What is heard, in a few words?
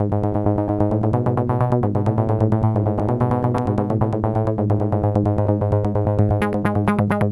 sum acid G 131, synth loop; Acidized; Synth Loops; Zero-G; Soundclash Nu Dance Electro; 131bpm